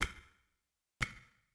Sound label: clock, ambience; xash3d fwgs; induction